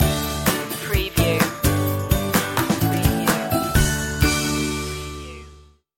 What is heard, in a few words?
lucky stinger